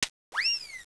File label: zing; 12000 Graphics Pack - A Complete Graphics Collection For Your PC